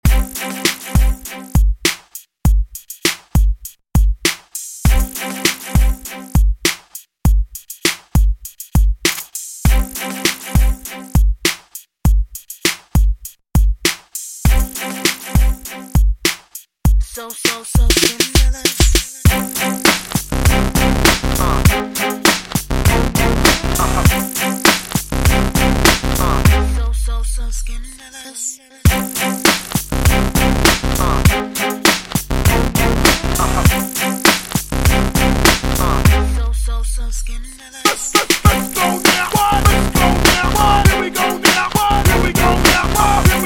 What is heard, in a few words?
ReDrum 100Bpm; remixplanet